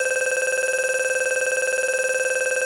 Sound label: electronic roulette wheel